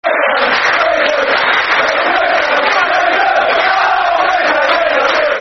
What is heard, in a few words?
We Love You West Ham Football Chant (Soccer Songs); West Ham WHFC